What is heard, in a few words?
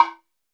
TUZUMI B5; AKAI S6000 CD-ROM - Volume 5